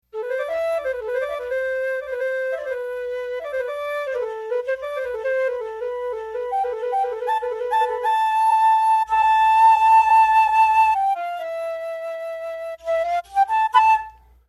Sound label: flute